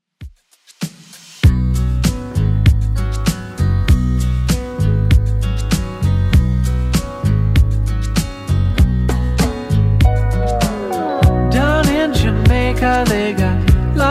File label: 70s Redrum 98 bpm